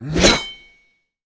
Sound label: Axe Attack; we are the dwarves gamerip 2016